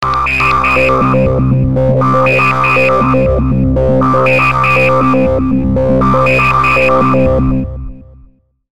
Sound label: MadArp